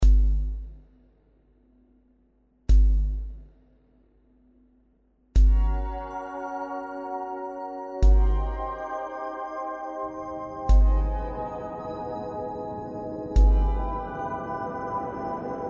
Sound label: Distortion Fun